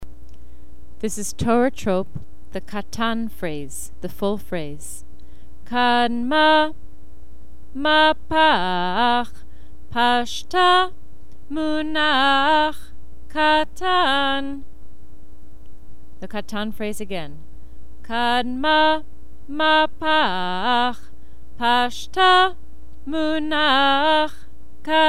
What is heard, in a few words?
Trope Katan phrase